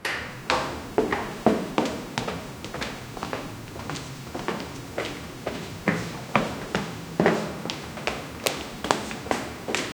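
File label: a noisy room becomes silent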